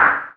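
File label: percussion, Roland MKS-80 Drums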